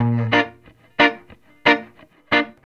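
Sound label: reggae electric guitar #A; Best Service Advanced Media Trax Modern Composer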